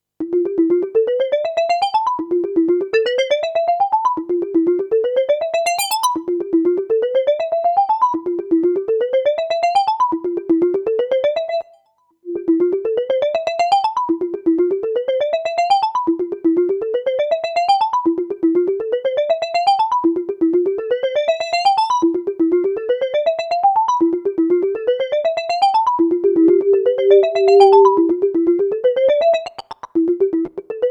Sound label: arpeggio; flykettle